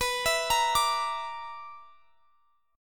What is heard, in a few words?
B-Minor Major 7th Double Flat 5th; BmM7bb5; arpeggiated; x,x,21,21,23,22